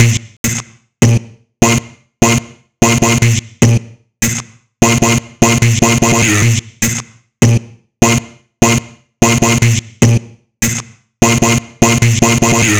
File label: Vocal chop, 150 Bbm; BeatSick; Slate Digital Omnivox Vocal Sample Pack; Chops